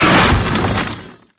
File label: collision